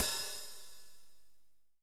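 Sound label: open hh, open hi-hat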